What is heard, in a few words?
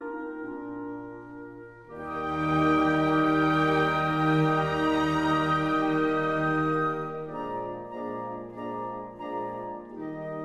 piano concerto c moll kv 491 no 24 larghetto